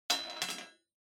Bingo Machine Drop

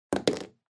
Eraser Put Down

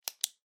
Ballpoint Pen Click

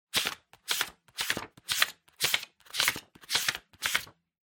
Book Flip

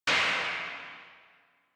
Impact Reverb; Onoma

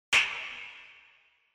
Castanets

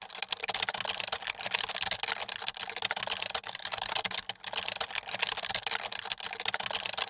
PC Keyboard

